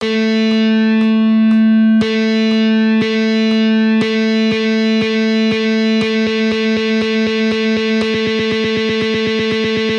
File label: Strumming patterns, guitar